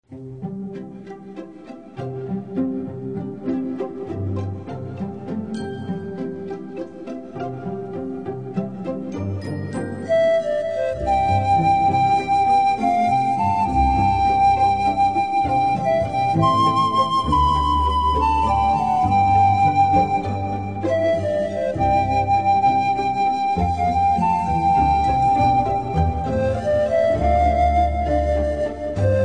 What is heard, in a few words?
Orchestra Panpipes, Blessed Assurance (Medley)